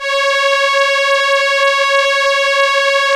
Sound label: STR ELKA, Elka Strings, Elka Violins